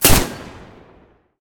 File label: basic heli; smite; zhongkui